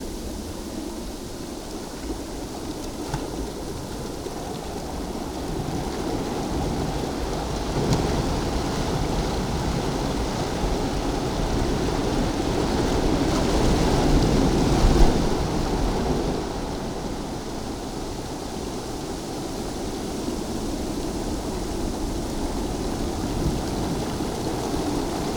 Ocean Sounds Waves; Ocean Sounds HD

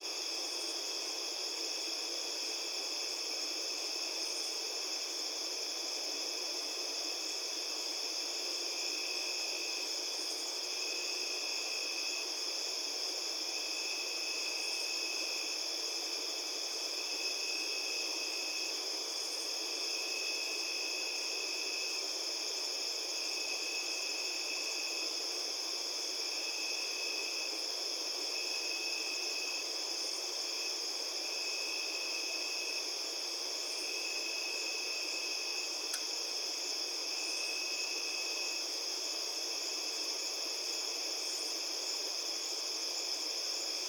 Evening Flower Garden Ambience

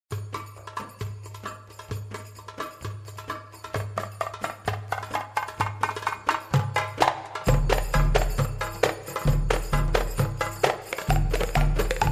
tabla

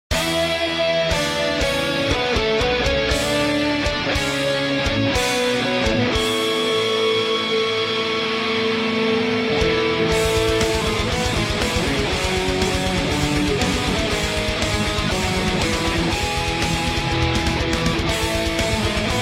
Death Metal; The Internationale

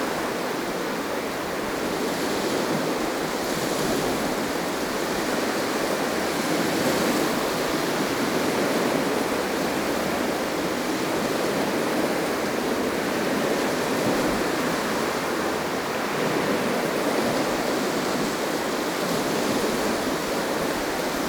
Ocean Waves ASMR Ocean Waves Tingles Loopable

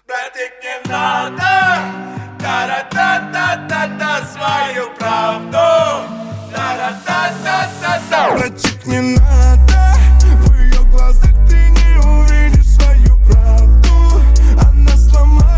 lute

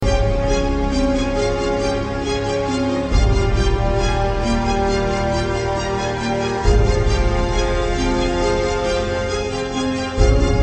instrumental

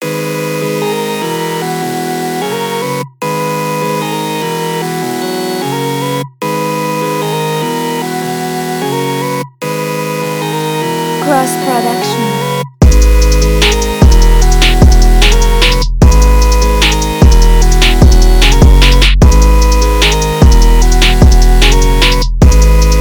HYPERPOP TYPE BEAT 150 BPM Bmaj

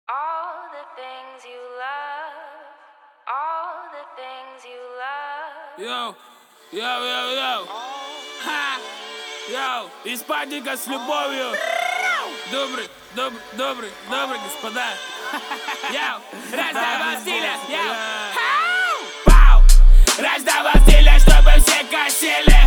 28-29-31Hz Low

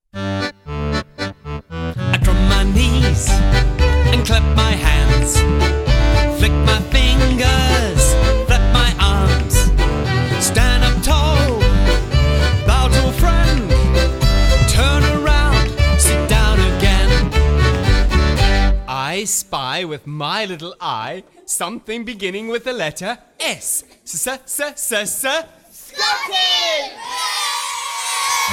Drum

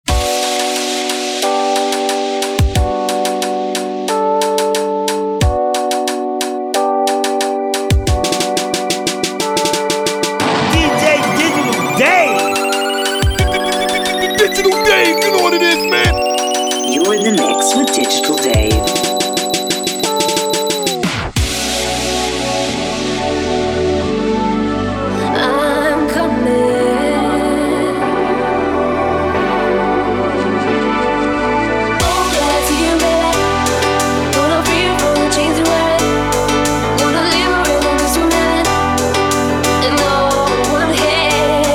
Dubstep

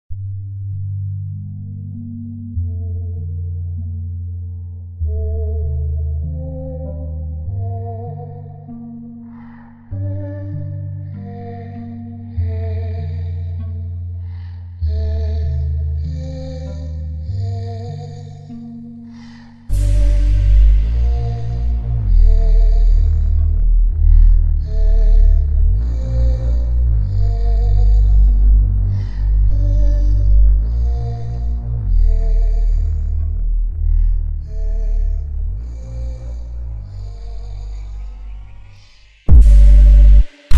ULTRA BASS

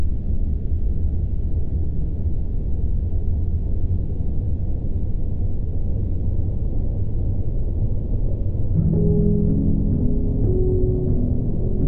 White Noise

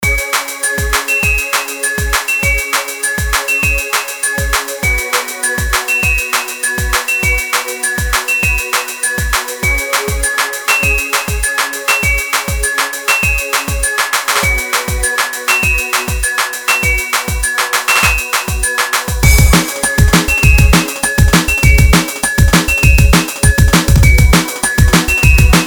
drumm bass remix

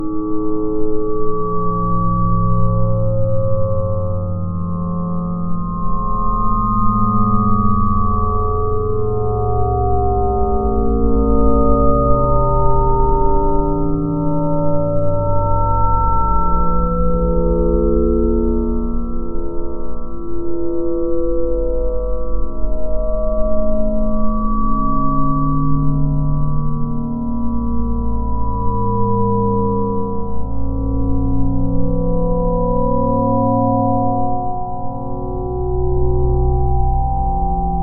Vaporwave

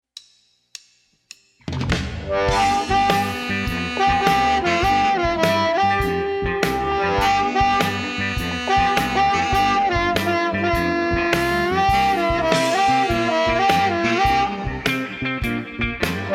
2nd Hole Solo

